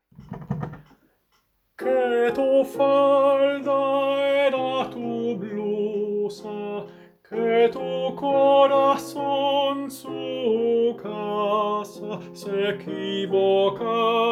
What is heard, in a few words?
la paloma-Tenor